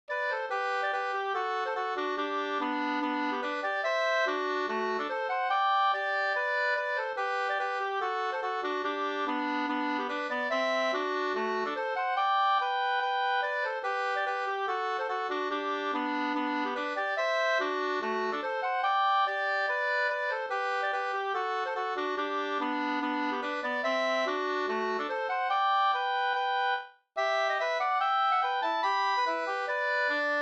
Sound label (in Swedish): schottis 4-4 Gdur